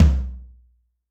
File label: Room Flr, Yamaha DTXpress-II Tom